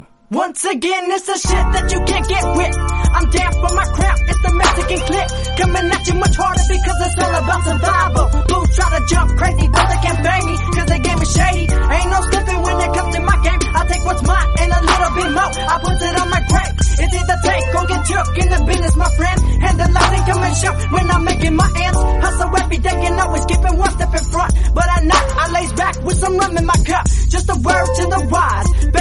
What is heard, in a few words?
mexican crown tone ringtone